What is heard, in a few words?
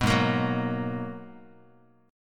G sharp Minor 9th, Guitar; strummed; 2,4,1,4,x,x; 4A,4E,5A,5D,5G#,6C#